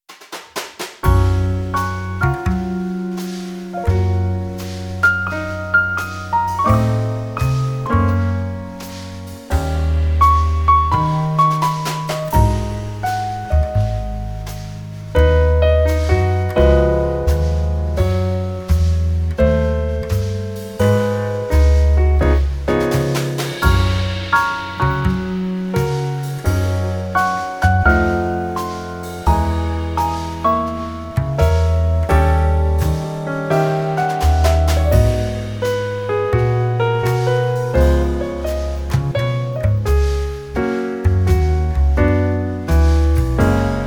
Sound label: steak jazz